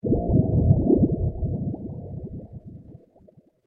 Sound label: falling drunk into a pond